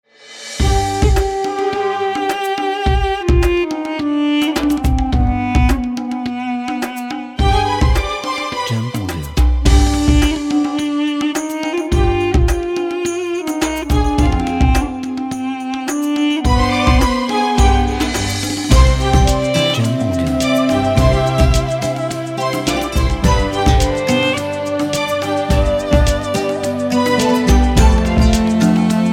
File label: Ramadan Arabian Middle East